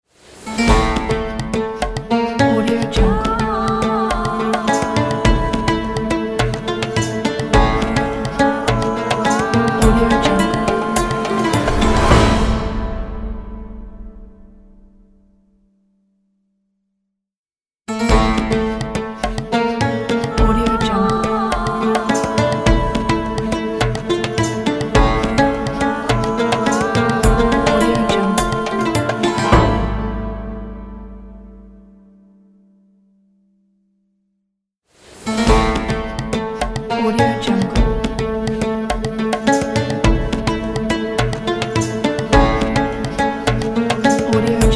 ramadan ident

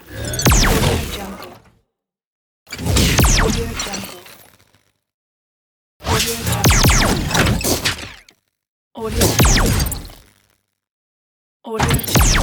plasma gun

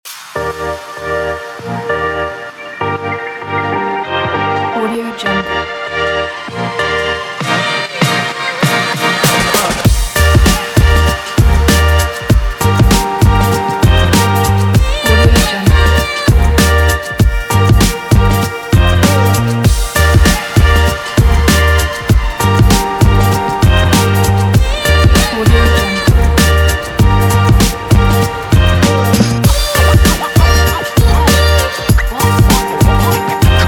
the hip hop

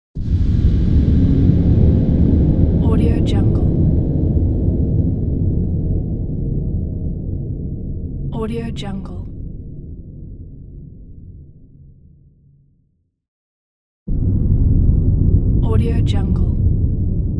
distant massive boom

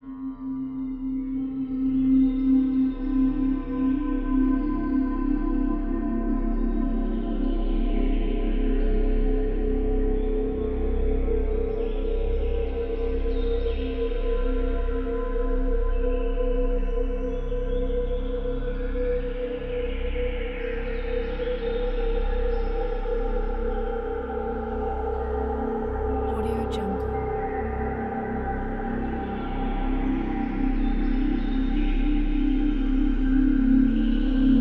forest psychedelic ambient soundscape loop; janondra

